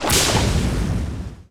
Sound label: lick target snap; weapons; dota; largo